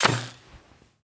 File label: bow attack; Rust